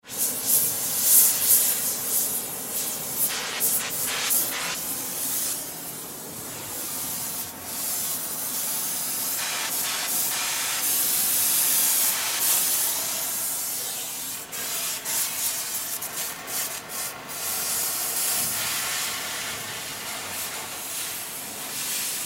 Blow Torch Cut, Blow Torch Cut Heat Steel Sheet Bottom In Water Sizzle; Welder; Welders; Industry; Blastwave